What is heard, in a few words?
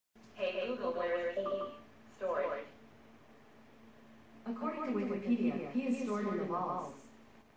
pee; cave